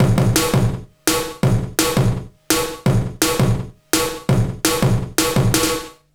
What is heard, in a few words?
166 BREAK, Jungle; USB Soundscan vol.05; AKAI; 90s sample CD